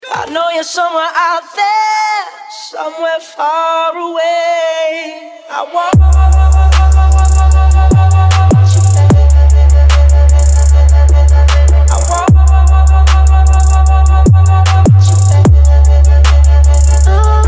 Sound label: funk mega bass